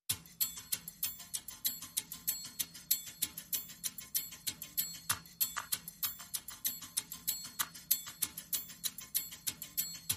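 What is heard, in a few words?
Percussion Only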